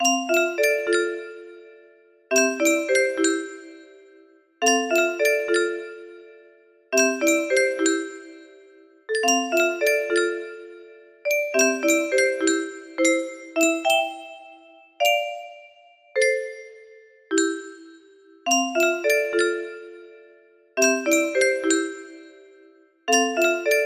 loopable, music box

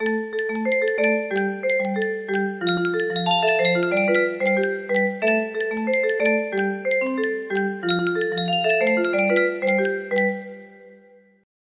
xylophone

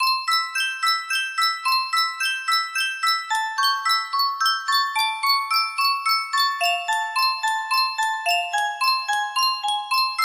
music box

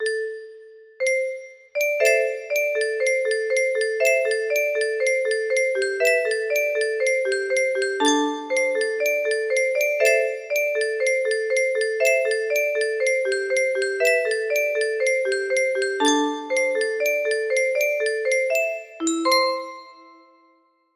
music box

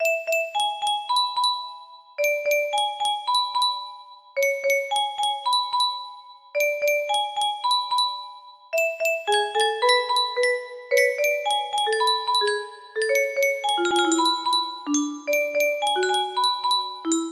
music box

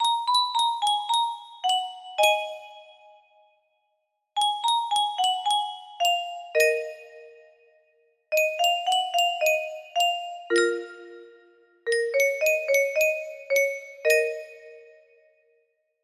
music box

